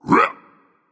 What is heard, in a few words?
grunt jump berserk; cuchulainn